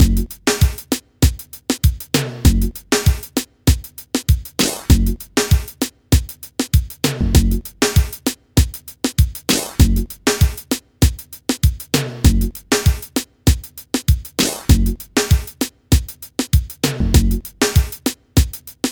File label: Essential Reggae